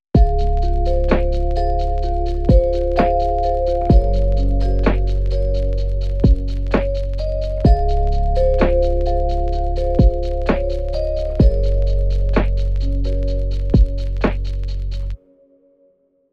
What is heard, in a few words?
with drums